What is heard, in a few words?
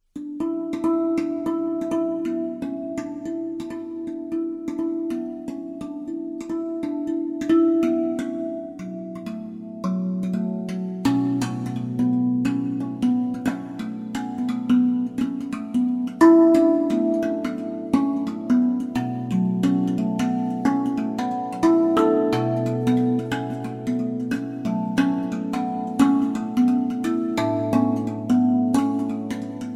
wilderness sound bite